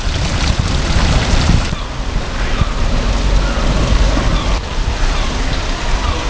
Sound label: pigeon